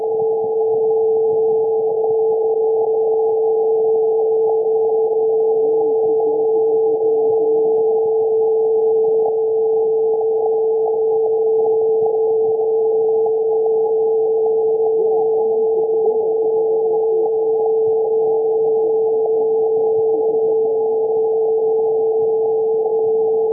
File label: laser test tone lpf; Lowpass filtered test tone; This is the recording of the 440 Hz fundamental frequency, lowpass filtered at about 800 Hz